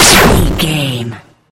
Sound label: retro laser gun shoot